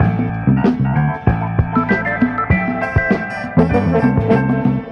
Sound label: piano, phonky loops